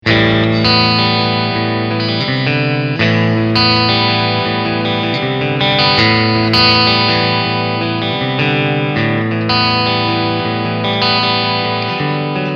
Front SCs Clean Picking

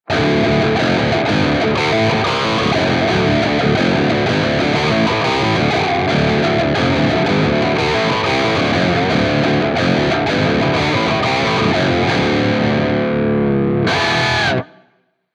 Crunch Octave; Gojira Plug-In Suite

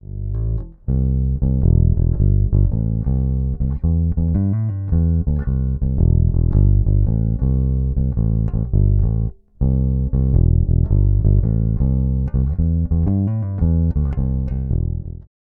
Fodera Monarch Standard Special Neck Pickup

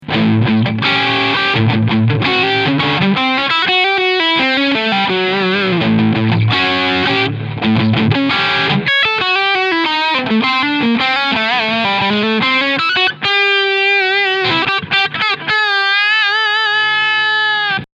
Asat Special Steg PU Overdrive; GL Tribute

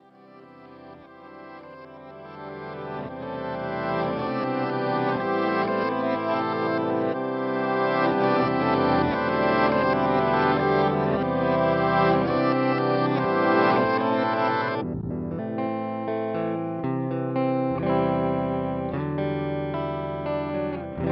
Walrus Audio ACS1 Room Ambience Loop

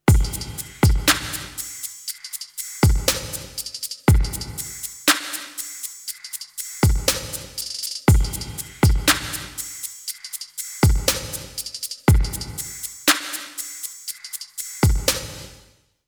DRP 20 Drumloop Roomverb Gated

